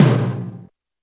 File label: Tom; Roland R-70